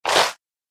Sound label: App Game - Sticky Splat